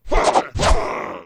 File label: Attack